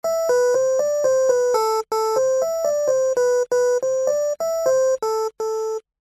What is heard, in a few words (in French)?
sonnerie; tetris